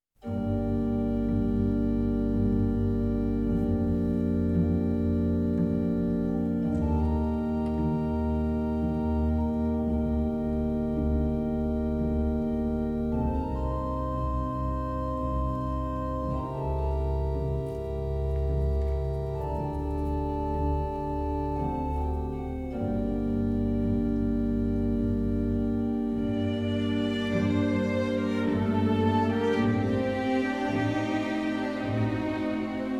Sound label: ADAGIO IN G MINOR FOR ORCHESTRA AND ORGAN